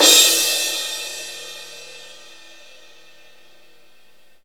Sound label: crash; East Collexion; Drum 1 Dry